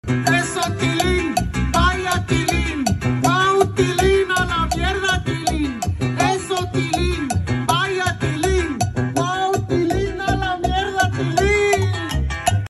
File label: cumbia